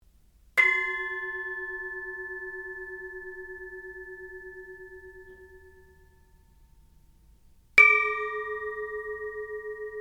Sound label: 4 Tenors